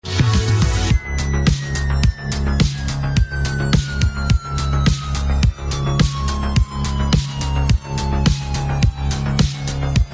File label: Techno ringtone